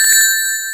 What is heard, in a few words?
RING BOUNCE